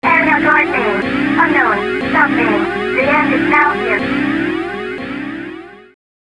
Warning

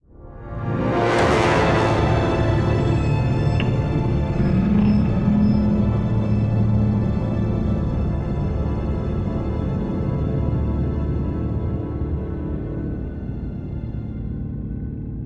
Shock Stinger; batman the enemy within

